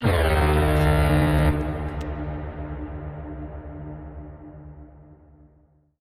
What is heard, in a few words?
air horn designer; SoundsCrate